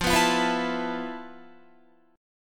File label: F Major 7th Flat 5th Guitar; strummed